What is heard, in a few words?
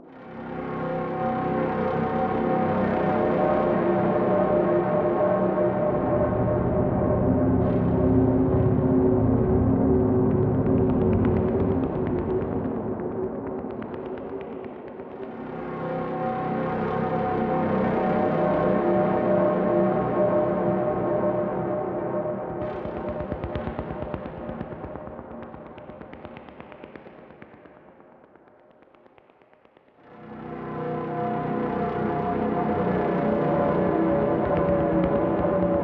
Infestation Loop, Horror Atmospheres Music